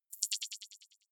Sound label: click decay, signale